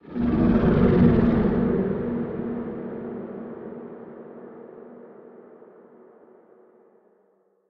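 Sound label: ambience unknown creatures kaiju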